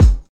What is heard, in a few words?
perfect bass drum sound g sharp key